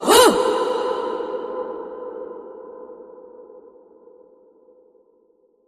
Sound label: Vocal Fury Queen Hoot